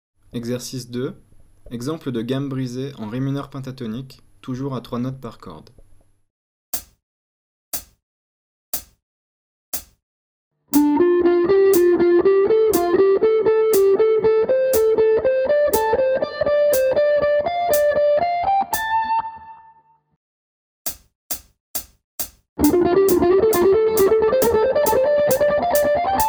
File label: penta 3 notes